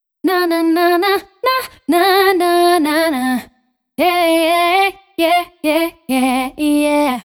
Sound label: Only Vocal